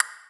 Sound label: Wood, Percussive FX; musicradar; cartoon caper